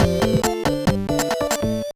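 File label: Jingle, Super Game Boy; Santa Claus Saves the Earth; gba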